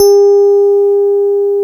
KEY EP F#3, Digital EP's; E.Pianos; Rhythm Section

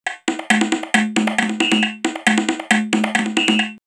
727 136, drumloops, DrumMachines; X-Static Goldmine

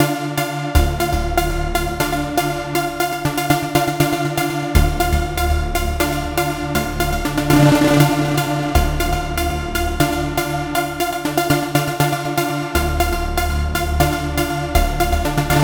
Trance Synth

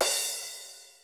cymbal, Roland MC-909

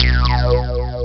CZ Echo Lead B0; Proteus 2000; Digital Sound Factory Proteus 2000

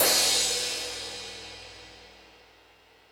Crash, Drum Machines E-MU Pro-Cussion

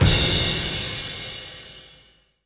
Kick Crash, Cymbal; Zero-G Smoke

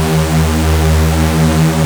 Prophet 5 D#3, Synthesizer; Hollywood Edge - Giorgio Moroder Rare Synthesizer Collection

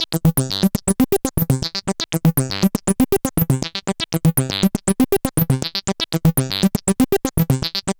Synth Sequence

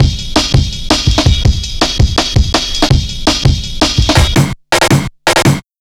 165, Drum Loops, Drum & Bass; Total Drum&Bass

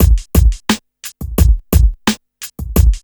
RNB 87 BEAT, Hip Hop R n B; USB Soundscan vol.19; AKAI

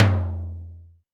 Tom Lo Ring; Alesis D4

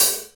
HH, Real

